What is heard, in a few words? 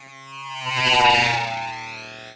SAW DOWN, anime sound fx, comic